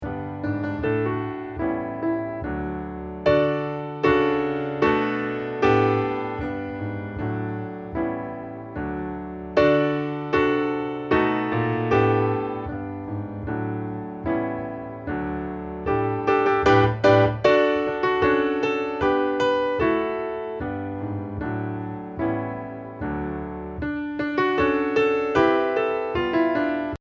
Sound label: accomp